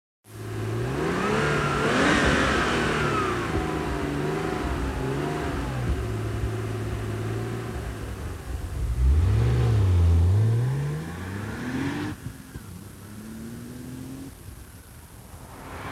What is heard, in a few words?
Porsche 911 Gt2 993